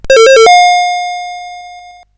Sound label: Buggy Boy, CSG8580R5